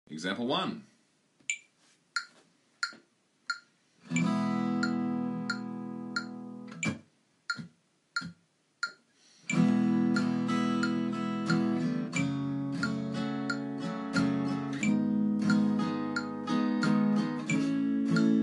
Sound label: Chords